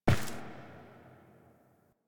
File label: Foley Turn; Warframe; The War Within